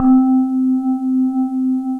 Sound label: Wavey Bowl, Majestic Mallets; Ableton; Mood Reel